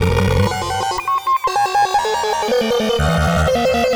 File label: Machine Control, Synth SFX; Sonic Foundry (Sony Creative Software); The Electronic Music Manuscript Collection